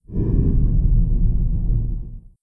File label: Windows Startup; Windows Never Released Soundpack 2